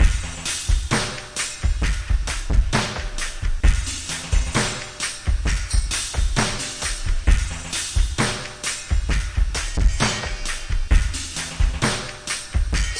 132 grungy trip with variations, DRUM LOOPS and BREAKS